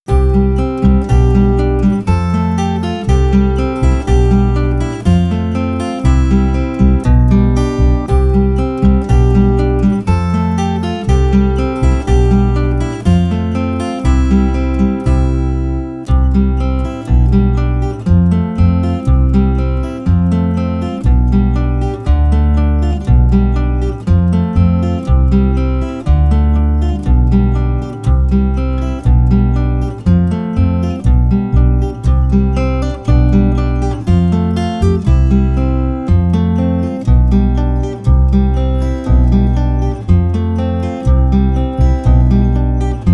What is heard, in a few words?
Instrumental